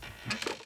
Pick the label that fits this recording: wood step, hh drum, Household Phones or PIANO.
wood step